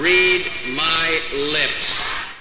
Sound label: lips